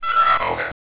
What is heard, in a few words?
blip; VitalWatch; rogue